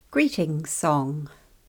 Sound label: Greetings song; Waiata books